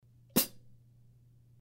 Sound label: S Rock Hats Chick, cymbal